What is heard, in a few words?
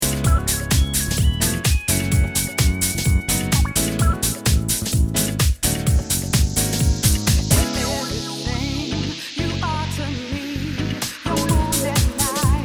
Vox